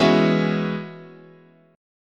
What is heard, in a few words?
E 6th Add 9th, chord, guitar